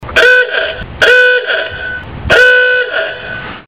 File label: Aooooooooooga